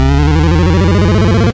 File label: Lift Off, Operator Effects